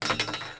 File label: drop, tavern; AMBIENT; Pirates Of The Caribbean